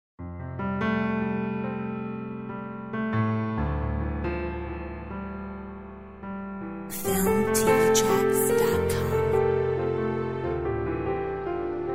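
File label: silent joy orchestral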